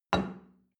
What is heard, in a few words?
plate place table medium short, restaurant cafe; sport-freizeit